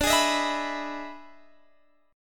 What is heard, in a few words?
E Major 7th D, E/D Major 7th chord